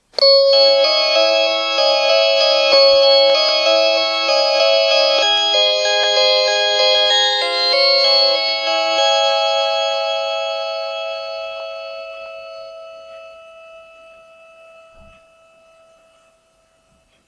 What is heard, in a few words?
tone